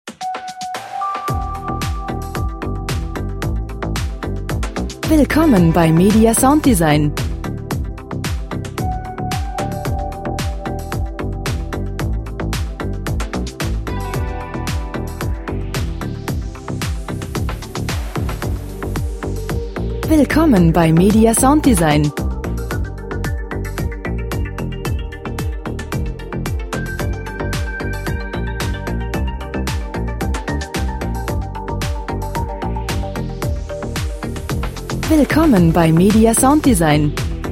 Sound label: modern heartbeat